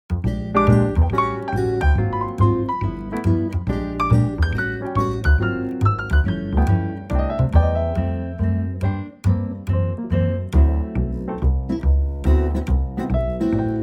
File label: Trio C